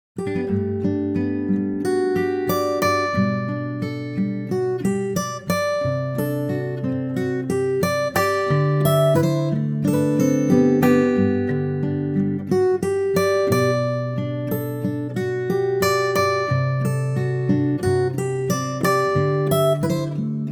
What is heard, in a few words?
Ac Guitar G